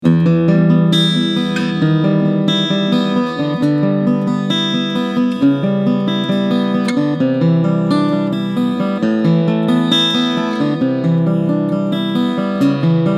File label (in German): Traveler Guitar Redlands Dreadnought Picking Mikrofon